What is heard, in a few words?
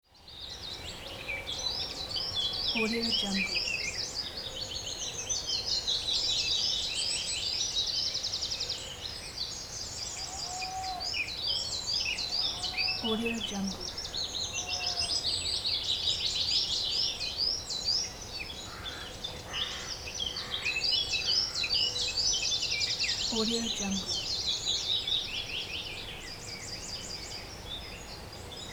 morning birds long daytime ambience